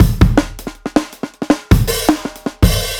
Funk Break, Drum Loops

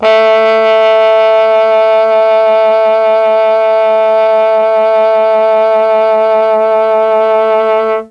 A2 TROMBONE, Mellotron; CHMB TRMBONE; Mike Pinder - Mellotron Archive CD

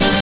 CYBER KIT, DRUMS

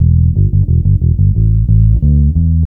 Bass Loop, Ambient Grooves; Sonic Foundry (Sony Creative Software)

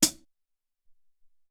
Mid Hat, Alesis SR-18

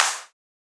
Drumloops Beat 128 BPM Solo Clap; VEC5 Multi Loops

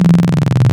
VV CMOS BP Hi Res PWM, wavetables; Animoog

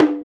EQ Perc, Percussion Mix

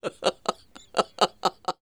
LAUGH, Jazz; Spectrasonics Vocal Planet; Jazz FX

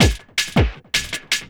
LOOP, 160 BPM, Drum & Bass

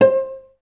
CLS PIZ C4, Advanced Orchestra; Best Service; Peter Siedlaczek's Advanced Orchestra

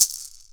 PUILI, HAWAII PERC

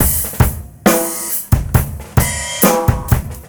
BEAT, Roots Funk; USB Soundscan vol.41; AKAI

